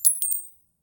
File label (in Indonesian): transaksi coin berbel